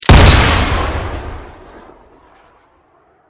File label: mortar shoot; weapons